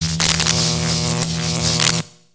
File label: Robot Electro Arcs; ambience; Lego; nexus tower